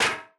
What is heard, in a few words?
stone barrel; Gold Miner Joe